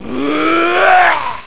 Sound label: Evil Grunt; Evil guy grunting